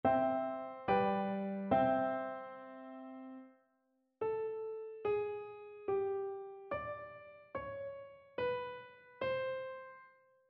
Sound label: melodic fragments; ear training